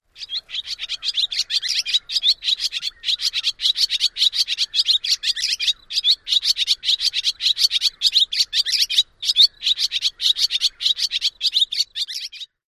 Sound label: excited dawn song